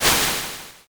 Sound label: swoosh